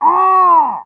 Pyro pain severe

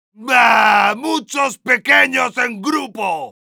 heavy jeers, es; "¡Daagh, muchos pequeños en grupo!"

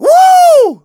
positive vocalization; Scout; "Whoooo!"

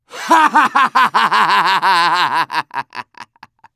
Medic laugh long

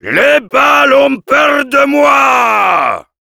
yell, fr; Heavy

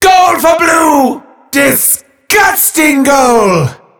soccer goal throw up; Merasmus

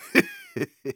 laugh short

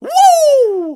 motorcycle

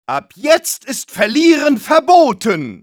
medic jeers, de

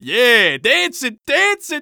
Scout taunt conga fun

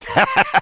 laugh short, fr; Sniper

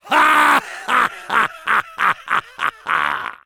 Soldier laugh long, fr; [Long gras 1]; Réponses du Soldier

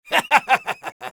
laugh happy; Sniper; Risa Feliz 1

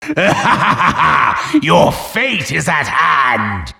wheel spin

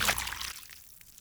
stagger slosh; Taunt demo

